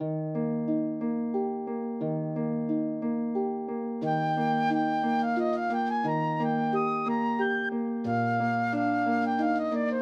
Flute and Harp; Serenades for Flute and Harp Vol 1; minuet from larlesienne suite n2

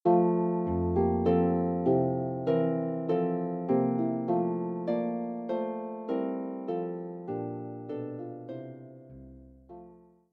Harp

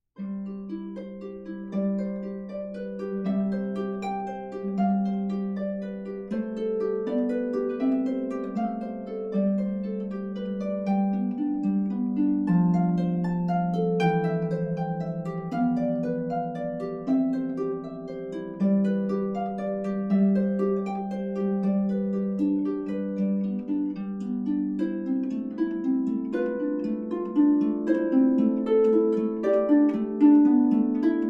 Three Note Arpeggio Etude, Harp; solo lever or pedal harp

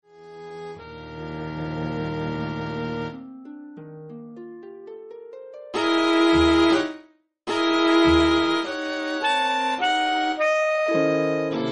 Alto Saxophone Harp Strings; Movement 2

